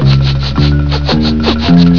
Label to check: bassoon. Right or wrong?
Wrong